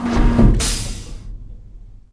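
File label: elevator interior end, doors elevators; Star Wars Obi-Wan; XBOX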